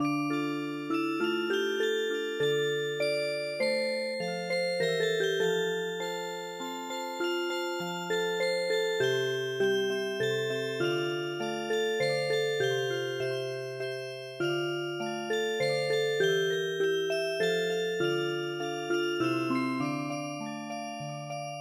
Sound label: Song Music Box; midi; traditional